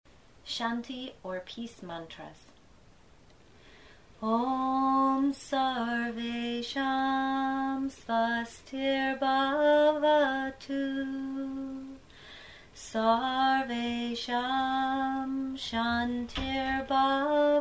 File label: shanti mantra